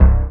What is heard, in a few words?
DM BAS, Dance Mega Synth